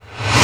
THUMB REV; AKAI S-Series CD-ROM Sound Library VOL-1

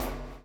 CHAIN; Spectrasonics - Backbeat

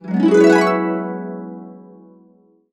E, Complete Harps; USB Soundscan vol.62; AKAI

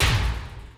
Ratl Boom, Alesis D4 drum machine

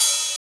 OP HAT; East West

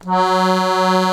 AKORDEON F#1, ACCORDION

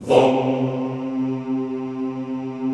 Classical Choir Hmmm C2; Best Service; Extended Classical Choir

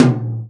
tom, Real Drum Kit, jungle kit

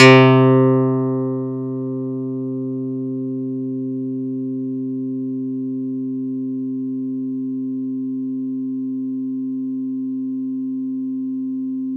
Yamaha YX81Z Elec Bass c4

